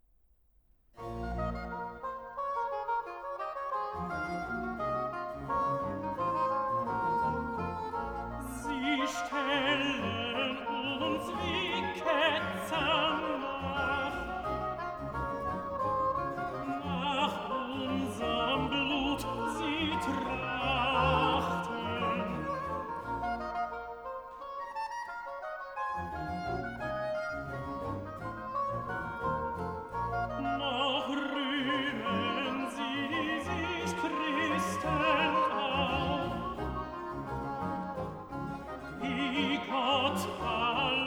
Tenor; BWV 178; Wo gott der Herr nicht bei uns hal; Sie stellen uns wie Ketzern nach

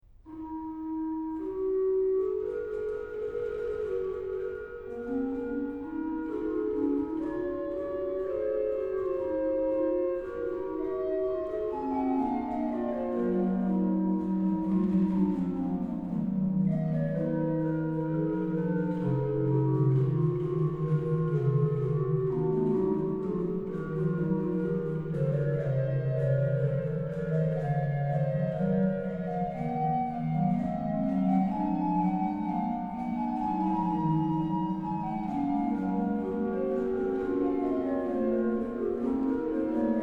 Christ, unser Herr, zum Jordan kam, organ; (alio modo); BACH; BACH ORGAN WORKS; CHORALE PRELUDES

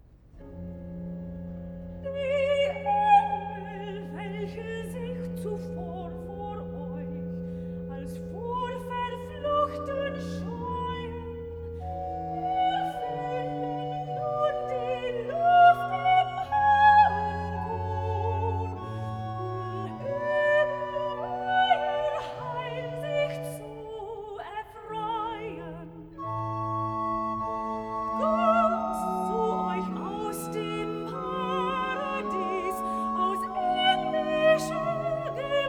recitativo, soprano; vocal; BWV122; Das neugeborne Kindelein; Die Engel, welche sich zuvor; BACH

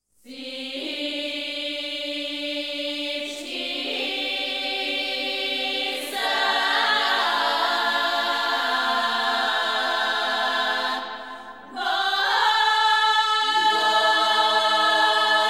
Bulgarian Female Choir; World music

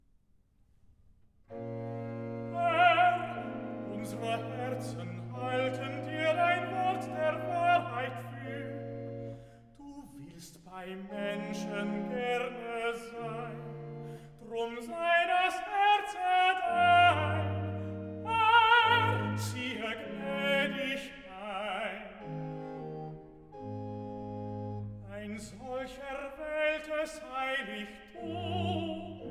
Recitative; Bach; BWV 34; O ewiges Feuer; Cantatas; DGG

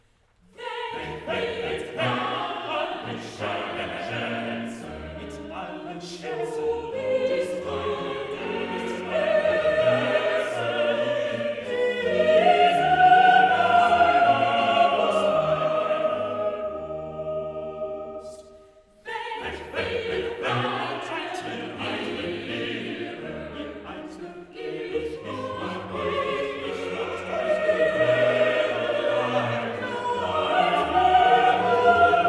Motet; Weg mit allen Schatzen!; Bach; BWV 227; Jesu, meine Freude

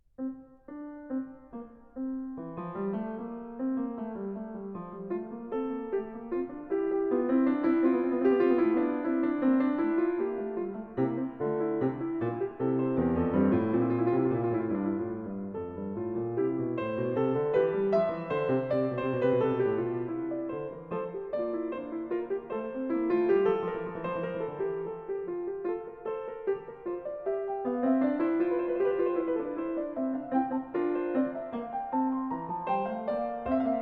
Fugue, F major; BWV856; Bach; Well-Tempered Clavier; Book 1

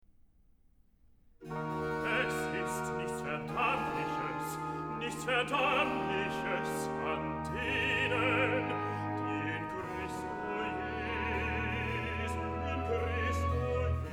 Recitative; BACH VOCAL; CANTATAS; DGG; Wer mich liebet, der wird mein Wort halten

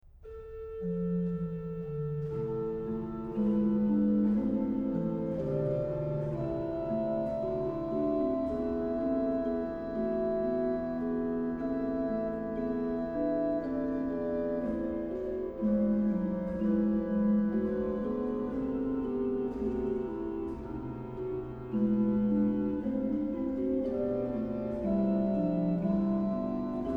Auf meinen lieben Gott, organ; BWVdeest; BACH; BACH ORGAN WORKS; CHORALE PRELUDES

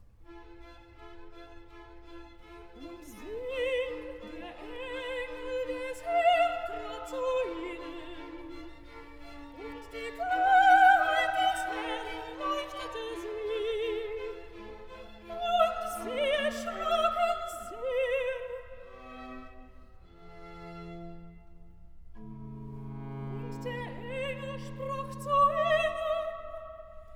Recitatif (Soprane II); Handel; Messiah; Recitatif accompagne

